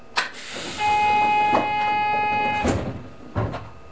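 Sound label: Door Close Right, Train; OpenBVE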